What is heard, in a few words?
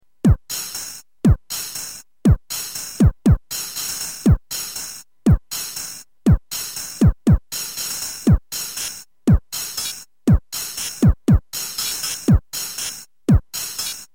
ambient rave